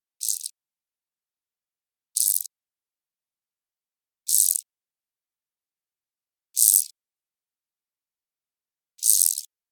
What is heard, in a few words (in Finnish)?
ketoheinasirkka